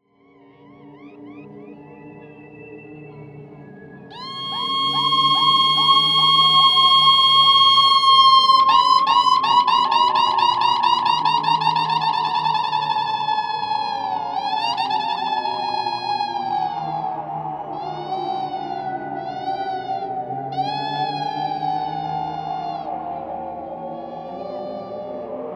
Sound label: ECHOFET Seagull